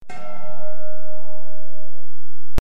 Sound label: Big Old Bell Single